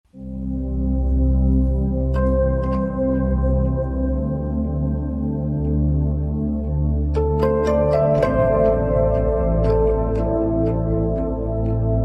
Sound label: bgm